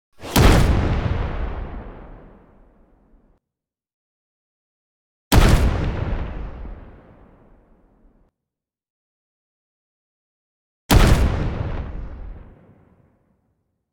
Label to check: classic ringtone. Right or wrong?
Wrong